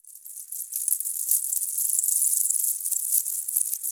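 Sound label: afro necklace, Tanzanian bell; Spectrasonics; Heart of Africa 1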